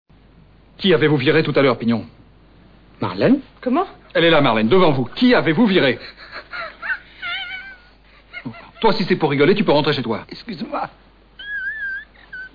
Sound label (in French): Rire Again